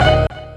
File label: Siren Loop; Proteus 2000; Digital Sound Factory Proteus 2000